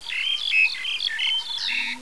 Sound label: Empty land; Caesar III